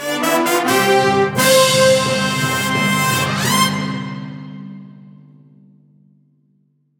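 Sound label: Finish Level Fanfare; Koa and the Five Pirates of Mara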